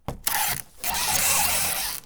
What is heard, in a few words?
packaging tape gun